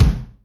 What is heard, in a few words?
Roomy, Yamaha DTXpress Acoustic Kick